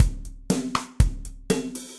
Kit Multirod Dry, Standard Kits; Ableton; Session Drums Studio

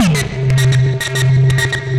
Sea of Metal, Synth Lead; Ableton; Factory Packs; Punch and Tilt

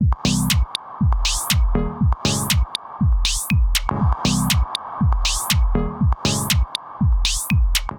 Minimal Notions Kit, Drums; Ableton; Punch and Tilt

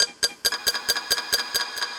Plucked Harp Effects, Sci-Fi Effects; Ableton; Mood Reel

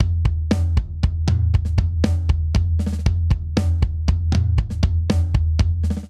Straight Three Rock Buildup 3/4 118 bpm, Drum; MIDI Clips; Ableton; Factory Packs; Drum Booth

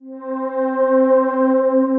Soft Choir; Voices

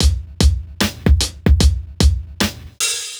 070 Chill Pop Drums; Pop Rock

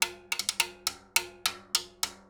104 Dance Timbales; Dance DJ; Percussion; World Dance

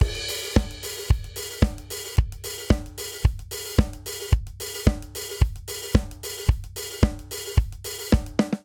Classic Disco Verse Variant 111 bpm; 4 4; MIDI Clips; Ableton; Factory Packs; Drum Booth

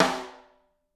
SN Overhead, Snare; Ableton Foundation FreePacks; Snare Designer

